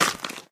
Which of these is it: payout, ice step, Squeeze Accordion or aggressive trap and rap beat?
ice step